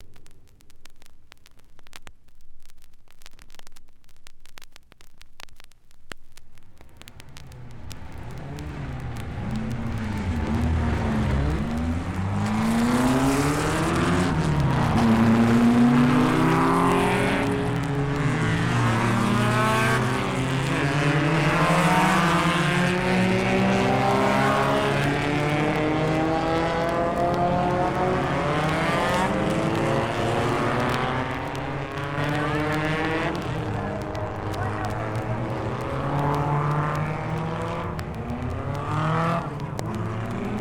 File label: Racing Cars (Starts And Racing)